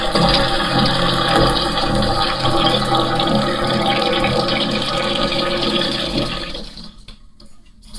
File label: toilet